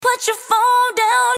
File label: phone